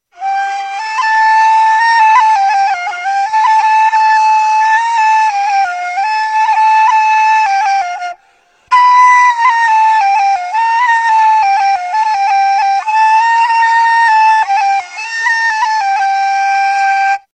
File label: nay; instruments